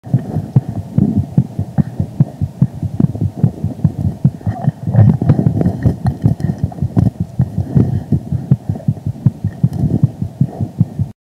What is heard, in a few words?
infant normal, cardiac; pediatric; pediatric-ai-scribe